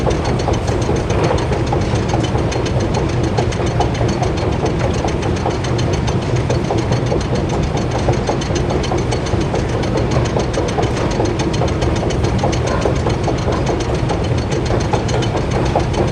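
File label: Assembly Conveyor Move Distant; Boomtown; Titanfall 2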